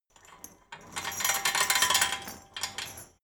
metal chain rattle short

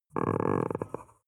chihuahua growl soft

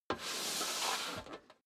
step high heels metal rattle slide long; schritte

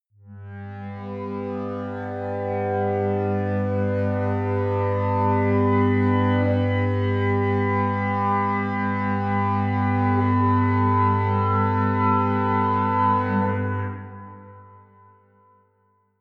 promo pad; drones

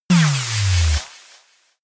loaded laser beam; krieg-waffen; soundpool

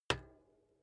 bicycle street kickstand hinge up soft; fahrrad; verkehr transport

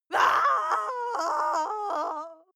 female lunatic scream pain long; schreie; menschen

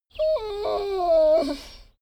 dogo argentino whine desperate; natur-tiere; hunde

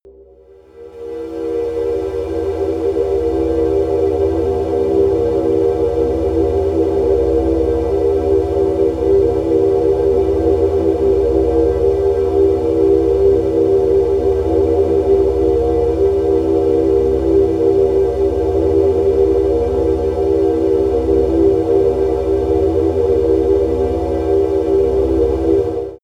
wide air, music elements

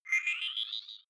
game start

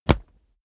combat cloth hit; krieg-waffen; nahkampf; soundpool

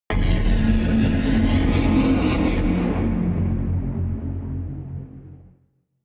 flanger flutter; whooshes rises; hits impacts

